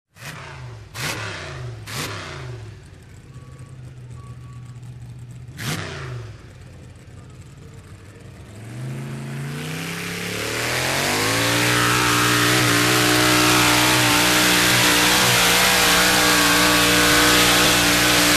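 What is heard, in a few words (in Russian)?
драгстер на старте движение остановка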